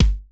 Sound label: kick, Roland MC-909